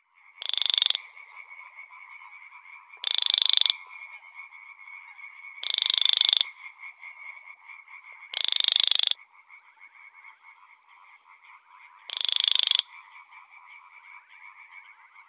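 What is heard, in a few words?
Baillon's crake, Porzana pusilla; pogonish kroshka; zvuki prirodi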